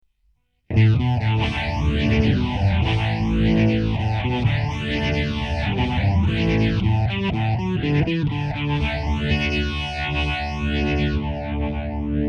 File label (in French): disto phaser, guitare